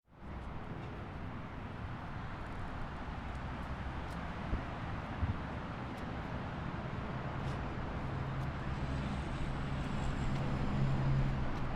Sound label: highway near waterfront